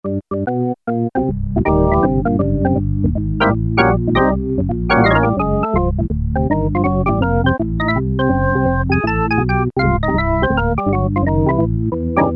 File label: Ensoniq ESQ-1 ORGAN